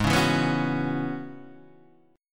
E 6th Add 9th G sharp

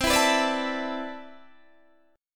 C Major 13th C, Guitar DADGAD

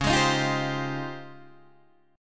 Db Major Sharp 11th, Guitar DADGAD

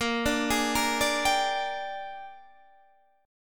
Bb 13th, Guitar, Half Step Down

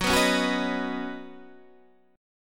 Eb Minor 7th Sharp 5th Gb

